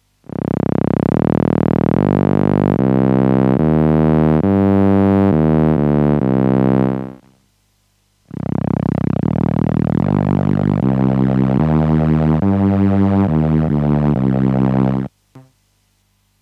Behringer Solina Bass Cello Mix

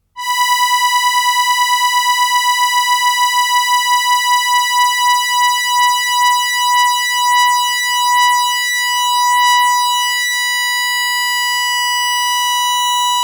OBSX Fine Tuning

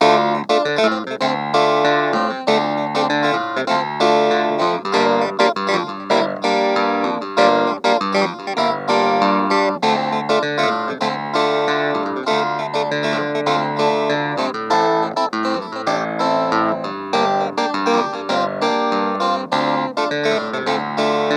Clavinet; Vermona VSR 3.2

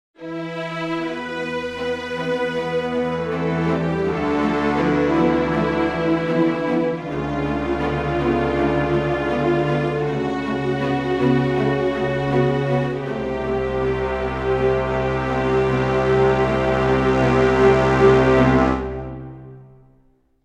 Albion One

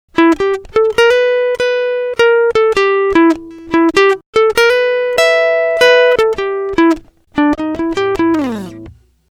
ovation elite

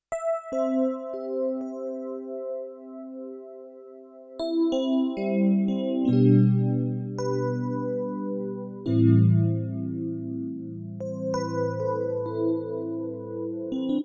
CVP609 EPiano

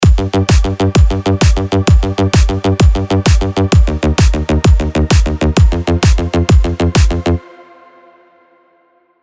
Nexus 3 EDM Triplet Groove

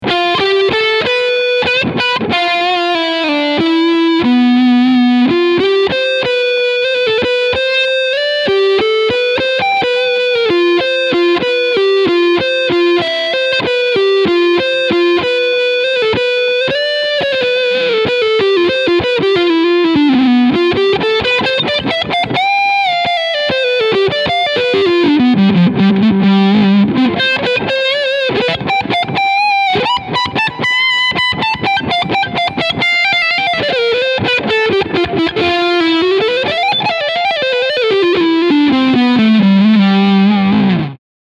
Leadsound Front Pickup

